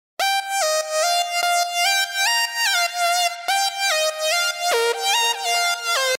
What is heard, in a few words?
ld synth; 1’09～のセクション、シンセリードは今回一番迷い; Synth Leads; Sun Moogy; Dada LifeのSausage Fattnerでかなり歪ませ明るくしています